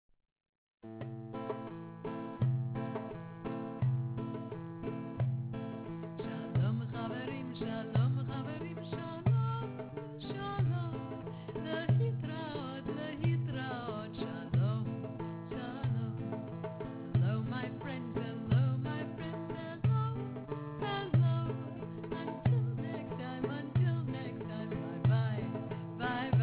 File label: Shalom Chaverim Folk Tune